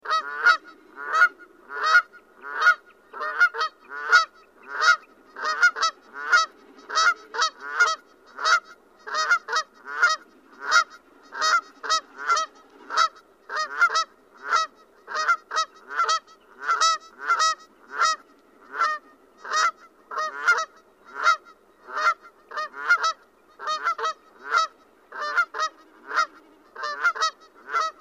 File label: Canada Goose Branta canadensis